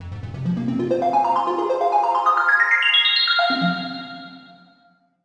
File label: synth; Diffusion; Processed